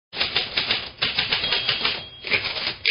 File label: typing